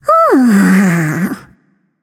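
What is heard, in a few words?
nonverbal content; smite; voicepack; izanami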